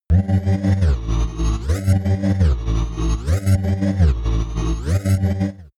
alien music; sweeps swells; comedy-cartoon; soundpool

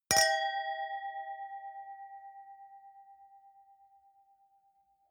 wine glass different clink bounce medium; berufe; gastronomie; soundpool

